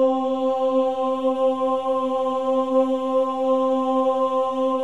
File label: CHOIR OOS, C4; Spectrasonics; Symphony of Voices